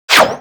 Bullet Parry Metal; BulletParries

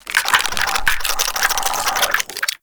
Web Charge; Warframe; Infested; Ancient Shell Agent

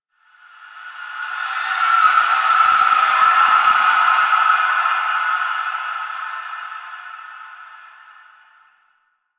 Memory Echoes

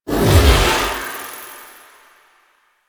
Moon Bridge; Ambience; Warframe; Orokin Moon

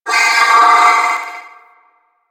Trooper Attack Long, Vocals; Warframe; DE; Sentients

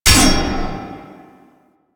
Parry Sword; Enemies; Weapons; Warframe; Teshin

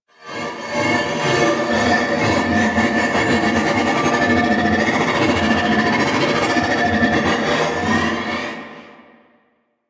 Eye Grenade Spin; Ambience; Orokin; Warframe; Orokin Moon

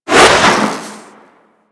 Jet Polearm Spin Whoosh, weapons; Grineer

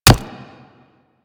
Player Bullet Impact Flesh; Warframe; Weapons

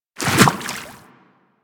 Infested Small Land Water, Footsteps; Warframe

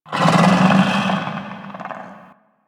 Interior Moan Gurgle; Ambience; Misc Indoor; DE; Warframe; Infested Eris

